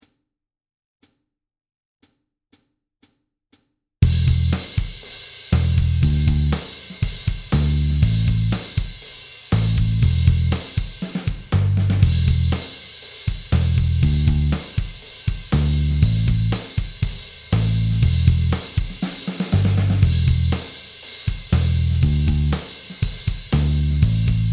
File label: No Guitar